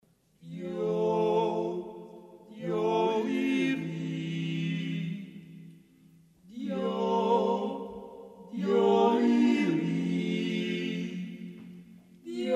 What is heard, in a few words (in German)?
Andachtsjodler